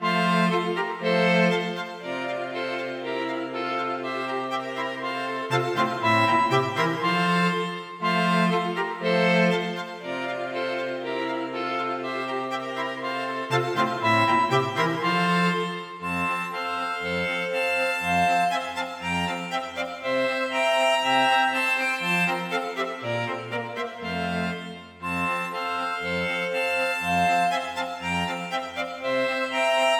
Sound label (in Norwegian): Fejer for strykekvartett og klarinett